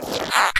bugbait squeeze; Source Engine; video game; weapons

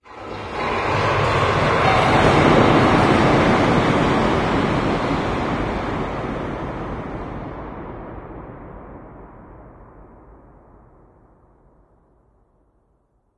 amb rock fall, ambient organic; video games; source engine; portal2

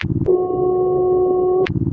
ambient, canals; video game; source engine; portal2

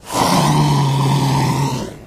zombie growl, hunter voice idle; player; source engine; left 4 dead 2

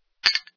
clank; ambient; Source Engine; cstrike

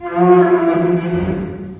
crane creak; Source Engine; video game

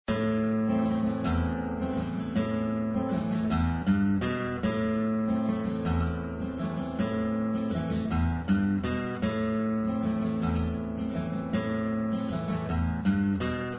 music; source engine; tf

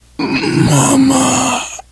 death scream, biker, survivor voice; source engine; left 4 dead 2

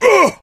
melee swing, player survivor voice

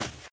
grass, footsteps walk; player; source engine; left 4 dead 2; boomer

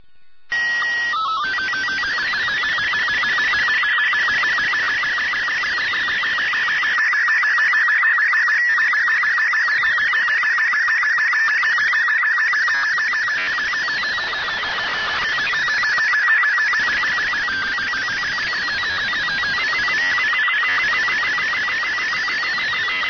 ambient; Portal 2; Source Engine; video games

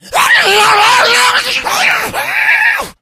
become enraged, infected, male; Left 4 Dead 2; Source Engine

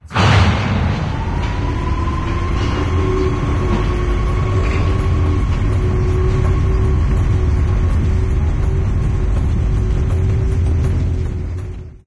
stalker train startup; ambient; source engine; episodic

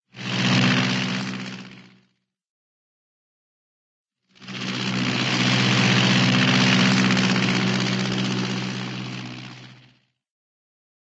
airplane, prop bleriot pass by, short; cartoon

